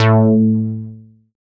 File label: FROG BASS; Masterbits; Dance Trax